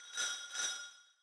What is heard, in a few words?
tone; Christmas; seasonal